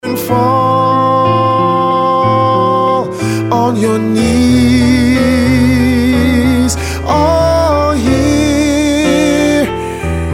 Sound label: Ringtone